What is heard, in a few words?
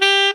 Sax